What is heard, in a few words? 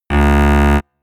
notification; samsung Aleart